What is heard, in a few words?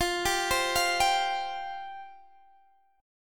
F Suspended 2nd, Guitar Open D